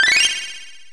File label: gain hp; chronotrigger